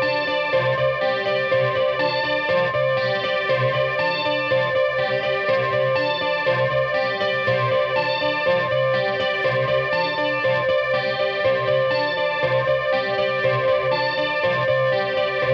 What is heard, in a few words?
Guit; Multitrack